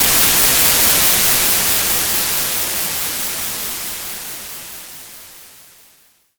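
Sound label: Riser Reverse White Noise Down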